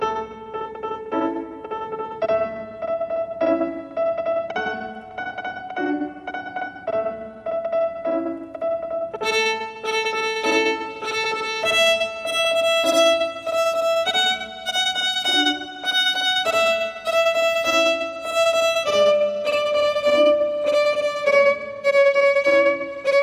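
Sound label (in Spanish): Twinkle Ritmo C Muy Lento, violin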